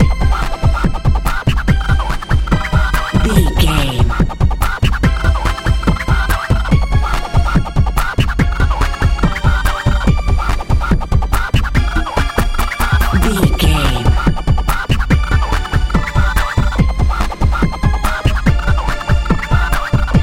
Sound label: dance pop